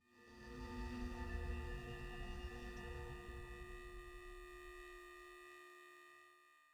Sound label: hum